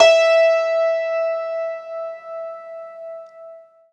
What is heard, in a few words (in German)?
HACKBRETT E2; Alpine Volksmusik